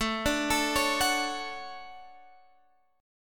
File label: D Major 7th, chord